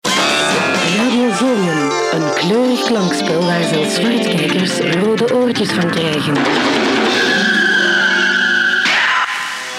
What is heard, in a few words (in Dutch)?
kleurig klankspel jingle; Radio Zoniën